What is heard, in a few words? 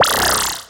big win lines; Back to 70s; wazdan